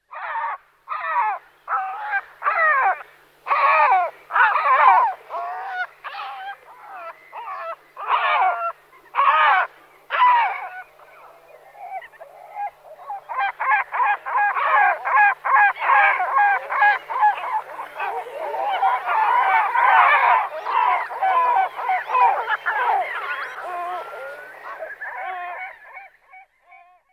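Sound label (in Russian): морская чайка умеет кричать и так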